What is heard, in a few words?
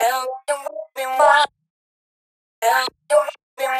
126 VOCAL LOOP, Funky Underground; Loopmasters; Funky Underground Vol 2